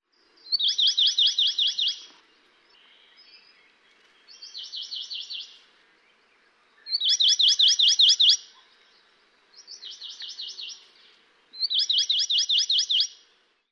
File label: kauai amakihi